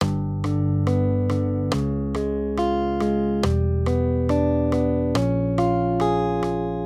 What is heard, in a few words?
maj triads; G-Db; accompaniment